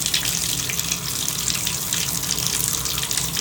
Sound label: bath; Golden Crayon Sounds